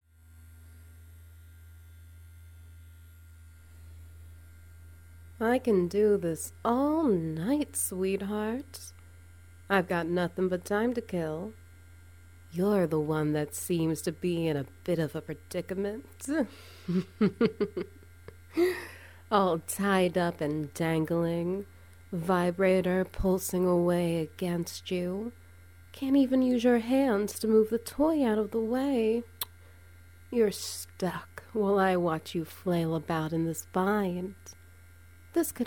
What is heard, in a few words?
SFX Vibe; Classified Intel